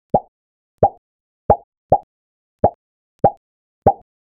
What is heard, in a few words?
eyes dramatically pop out; expression